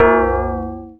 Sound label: A#, Synclav FM PERCUSSION; Big Fish Audio